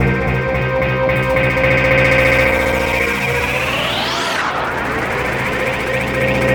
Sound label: Disco TransitionFX